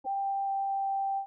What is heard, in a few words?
trumpet